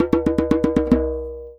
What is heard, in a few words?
Djembe Fill; percussion; musicradar